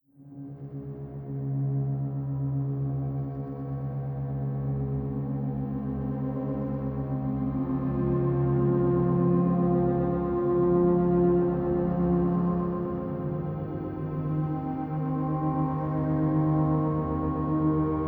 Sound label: Delta Binaural 432 Hz, Asian Zen Spa Music